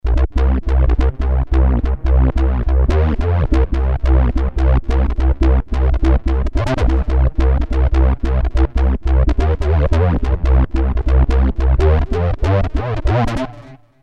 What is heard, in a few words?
bass quick, yamaha sy99 synth